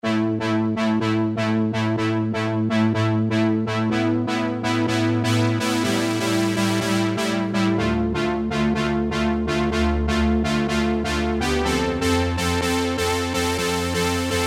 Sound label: KS Lush Keys, SERUM KEYS